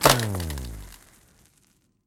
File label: Break Electronics